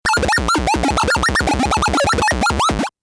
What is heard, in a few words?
bleep tone